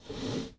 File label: Foosball Blocker Slide